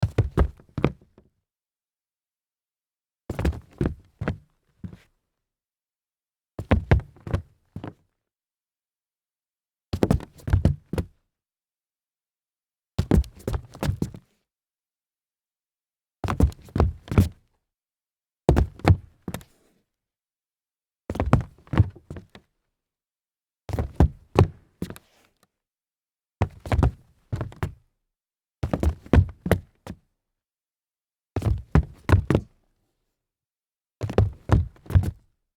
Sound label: stumble hollow wood